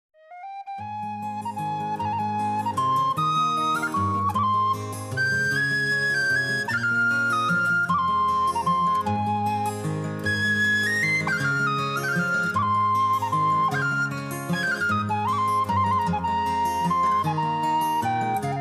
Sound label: Instrumental Medley